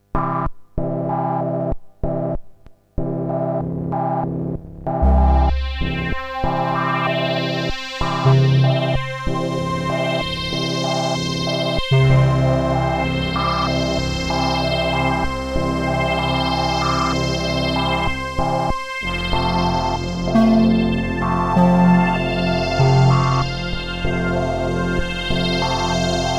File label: new sounds, New Analog Patches